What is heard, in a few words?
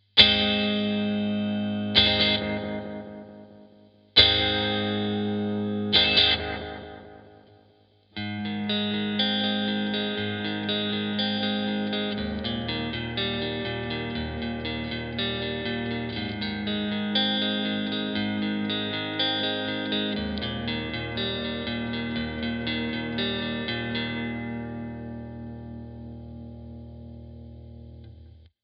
ad-9 keeley mod